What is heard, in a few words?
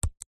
sat nav touch screen finger single tap on screen